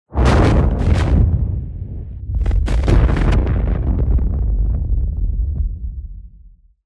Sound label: Explosion